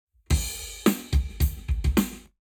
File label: Lab Wet Drums 0deg